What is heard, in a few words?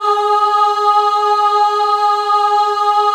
G#3 WOM AH, Choir; USB Soundscan vol.28; Acoustic & Synth; AKAI